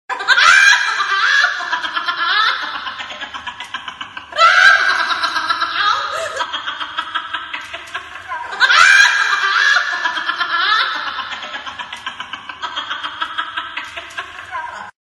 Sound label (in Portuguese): risada de bruxa assustadora